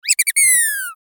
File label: mouse squeak